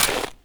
Step Ice, Footsteps

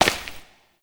Step Rock; Warframe; Koumei

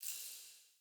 Fey Ward Healing Totem Small Flower

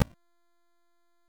Short Sword Medium Parry Impact

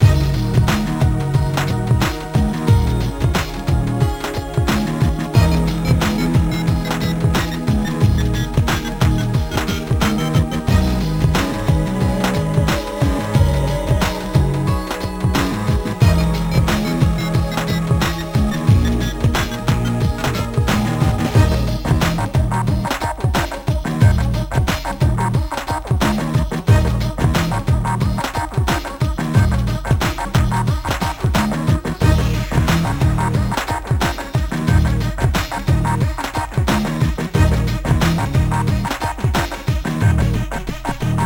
Music Credits; Warframe; Caliber Chicks Minigame

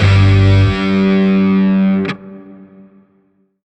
Shawzin Pent Min Chord J; Music; Warframe; Grineer; Chords

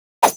Swing; Dual Zoren Prime; Weapons

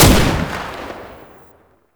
Fire, Weapons; Warframe; Scaldra AK

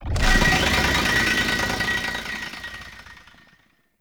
Whoosh Large; Warframe; Prime Masseter

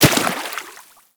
Run Water, Footsteps; Warframe; Operator

